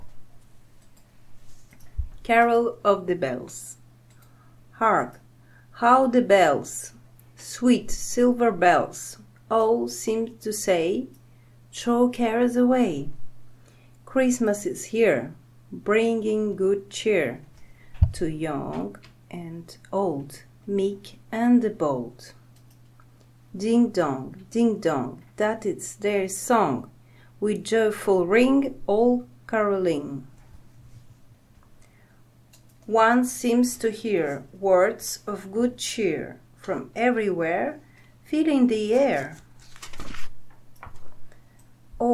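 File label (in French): Noël Ukrainien Carol of the bells paroles en anglais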